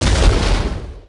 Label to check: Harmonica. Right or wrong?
Wrong